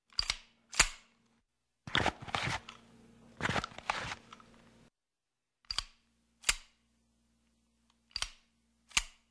Pistolet M1911A1

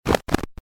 pomehi v sisteme kommunikacii

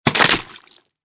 padenie v luzhu; Звуки падения человека

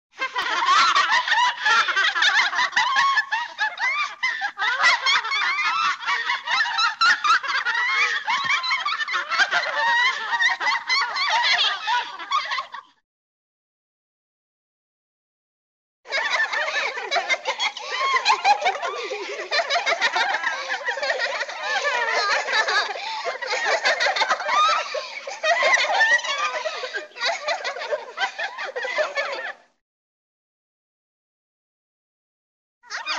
tri varianta hohota neskolkih molodyh vedmochek; Для Хэллоуина; смеха ведьмы; Коварный злой смех старой ведьмы, забавный смех

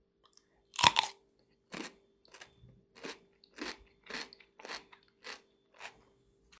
otkusyvanie celogo ogurca; Звуки откусывания

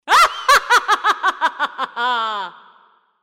zlaja vedma strashno smeetsja; Для Хэллоуина; смеха ведьмы; Коварный злой смех старой ведьмы, забавный смех

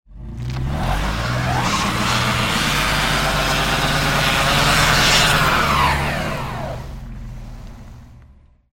mashina ne mozhet ybratsja iz jamy

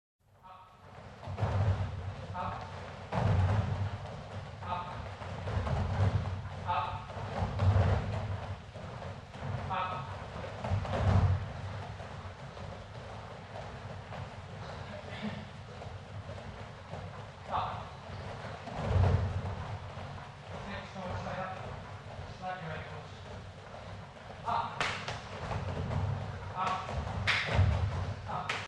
uprazhnenija po pryzhkam

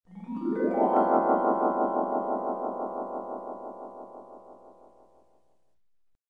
skazochnoe dejstvie vibrofon

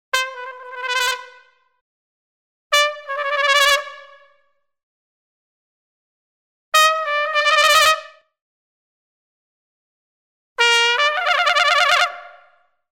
nagnetanie komichnoe

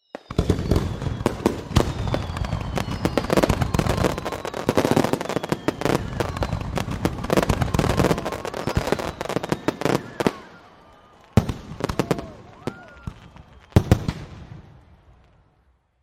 s tolpoj; звук салюта или звук фейерверка

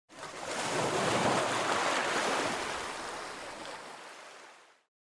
pribrezhnaja spokojnaja volna

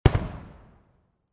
odin vzryv v nebe; Звук салюта; Звук фейерверка; звук салюта победы

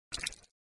Bulkauchi zvuk smeny kadra

